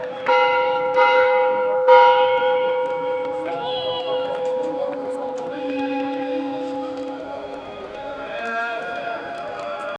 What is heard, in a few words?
shankh echo temple bells; ceremonial